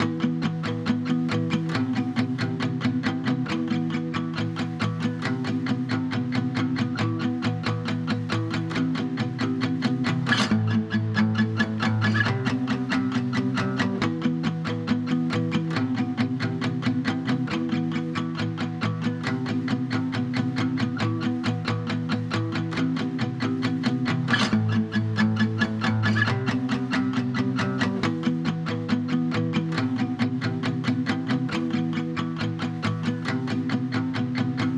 137 BPM E Min Main Guitar; Cymatics; Miami Guitar Pack - Pt 2; Snakes

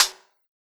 Hat, Lo-fi; One Shots; Ghosthack; Drum Mega Bundle Demo; Hip-Hop & Lofi Drums

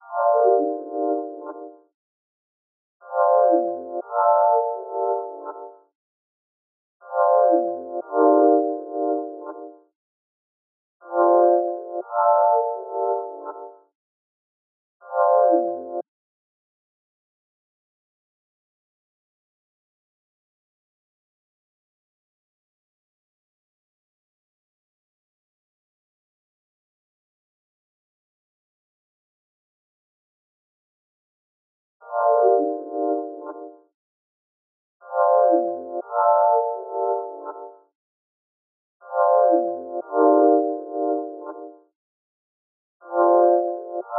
120 BPM G Min Lead; Cymatics; Blue Eyes

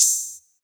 Open Hat; Modern Drum Kit

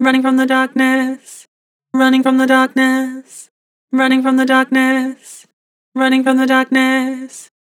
Vibrato, Vocals; Harmor Vocals

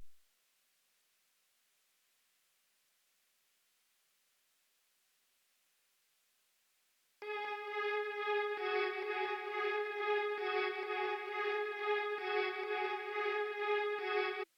133 BPM C# Min String; Trap; Melodies; Loop Stems; Cymatics; Trap Starter Pack; Harm's Way

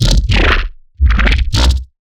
Dirty Bass, Bass Hits; One-Shots; GlitchWorld; Ghosthack; Color Bass Essentials

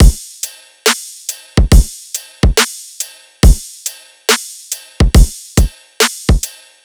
Riddim Drum Loop Full (140); Drum Loops; Ghosthack; Riddim Essentials 2020

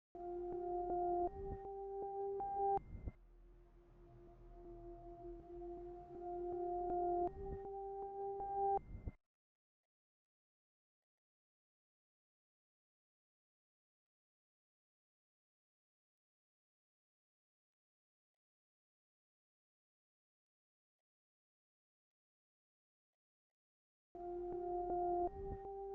160 BPM G Min Lead; RnB; Loop Stems; Cymatics; Aroma; 2022 Melody Collection; RnB Pt 1

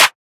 clap oneshot; GhostHack

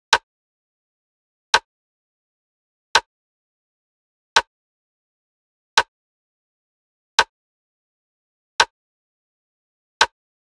Drum Loop, 85 BPM Rim; Loop Stems; Cymatics; Vibes II Drum Loop Collection; Healing Drum Loop

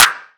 Clap, Afrobeats; Drum One Shots; Afrobeats Drum Kit; Cymatics; PARADOX II

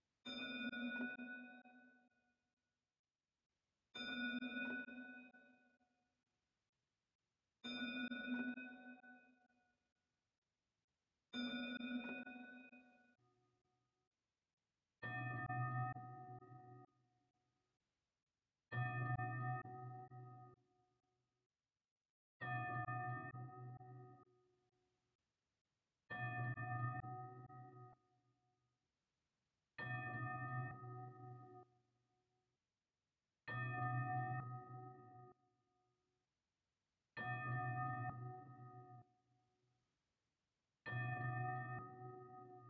130 BPM D# Min Bell, Loop Stems; Melodies; Cymatics; 2022 Melody Mystery Expansion; Good And Evil

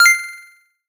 Collectibles Classic FX

